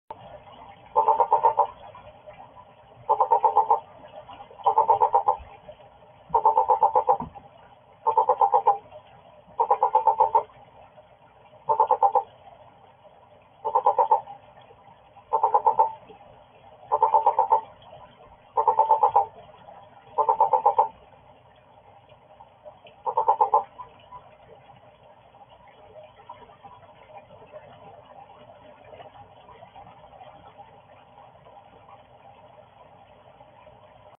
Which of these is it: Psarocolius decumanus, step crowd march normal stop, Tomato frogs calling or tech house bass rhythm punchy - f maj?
Tomato frogs calling